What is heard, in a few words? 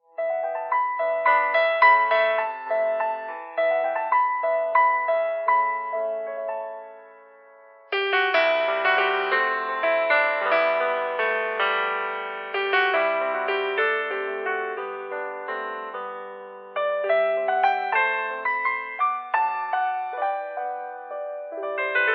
Emotional wave, ringtone; SKY IM-A870S VEGA Iron